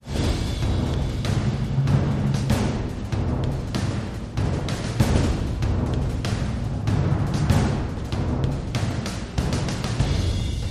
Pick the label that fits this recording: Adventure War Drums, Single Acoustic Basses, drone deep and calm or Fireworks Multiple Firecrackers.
Adventure War Drums